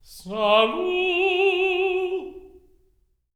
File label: TEN. TRAD; Spectrasonics; Symphony of Voices